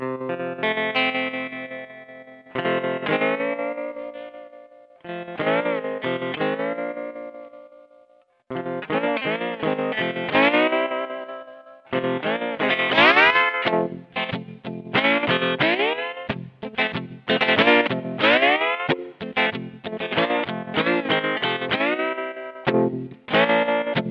Strings

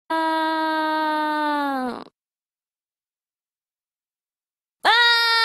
ahhh AHHHHH; Zero two

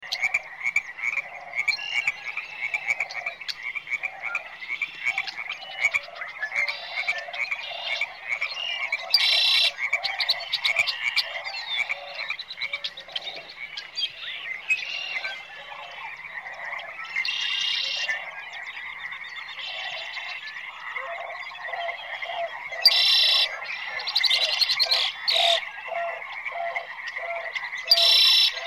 Animals Sound

